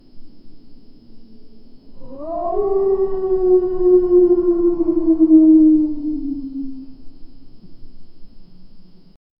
wolf like pack howling in

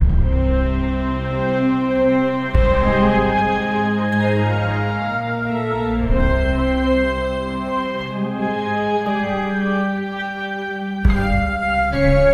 make music about deep sad, melancholy

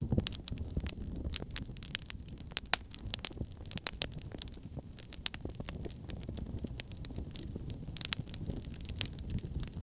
fire crackle, campfire

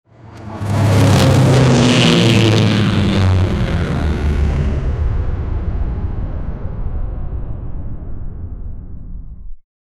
aeroplane blast, jet

